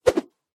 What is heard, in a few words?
Motion Swish